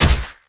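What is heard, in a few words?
crowbar hit body; weapons; cbar; tf2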